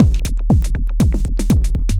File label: xtra techno beat; Sonic Foundry (Sony Creative Software); Ugly Remnants VOL-2